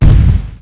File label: Fall